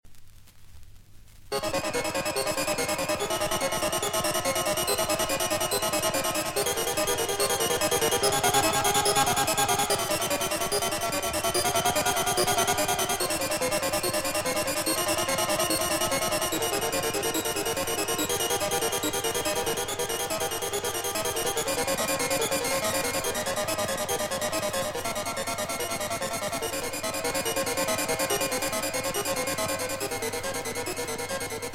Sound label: Prelude and Fugue in C Minor, Clavier; Well-Tempered Clavier